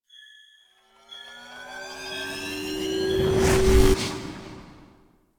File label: Recall Charge 3D; Railjack